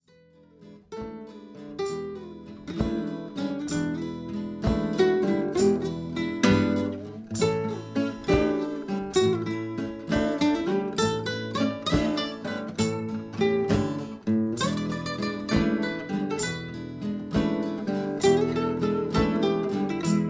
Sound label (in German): Trio Blues, Luftablasserblues